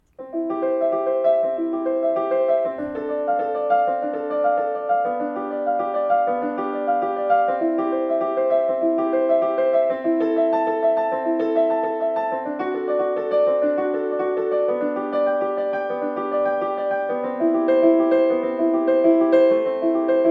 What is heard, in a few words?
jingle